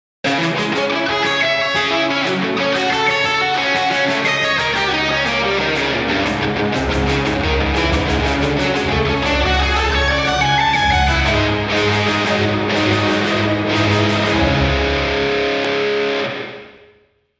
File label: Neoclassical Film Music Arpeggios